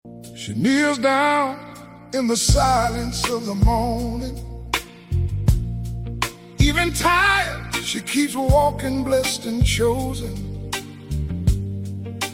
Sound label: emotional music blues soulful voice